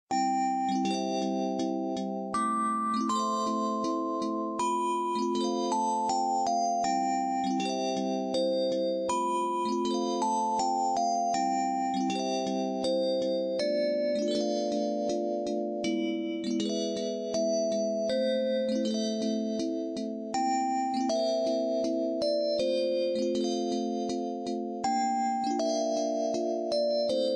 Sleepy Melody

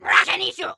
Fallen Warcry; Diablo2

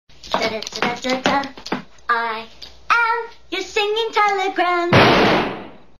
Singing telegram

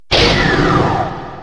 Mechanical sound

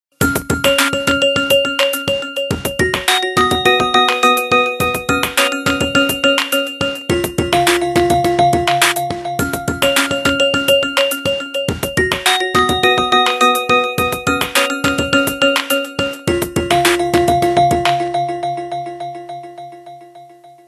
Delay

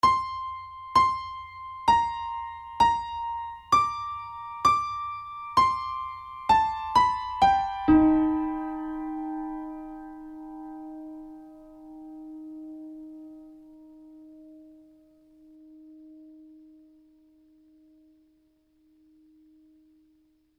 Piano

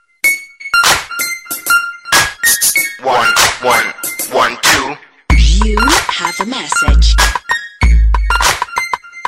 Hip Hop Alert

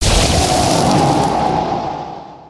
Space Wind Freeze

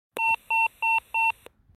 Motorola 4 beep

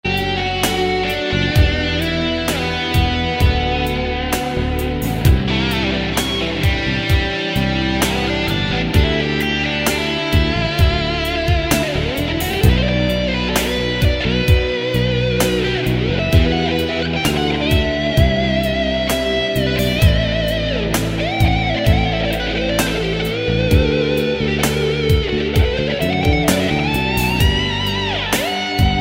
Guitar

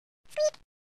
Boo Squeak

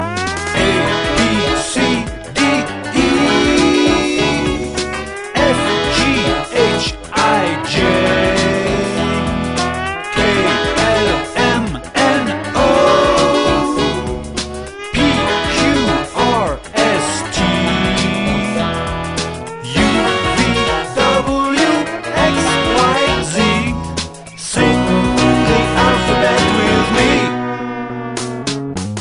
Sing The Alphabet

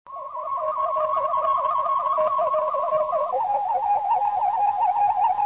Med Scan short

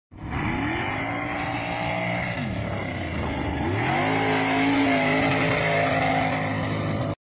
V8 racecar

